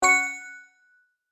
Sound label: Bright success ding notification positive